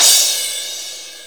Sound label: soft hit finish